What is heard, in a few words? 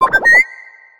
cry